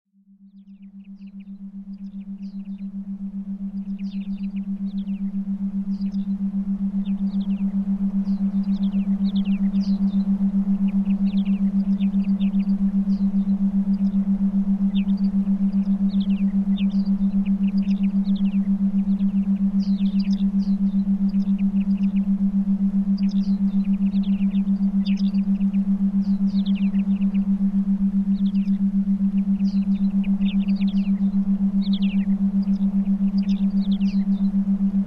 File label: Binaural Beats Theta Meditation Beat Forest